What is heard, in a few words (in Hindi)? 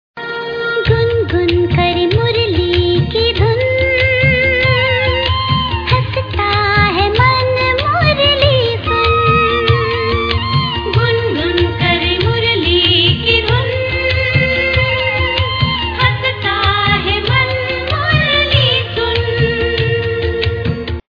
Murli Ki Dhun